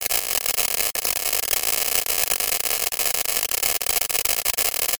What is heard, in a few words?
applause, Human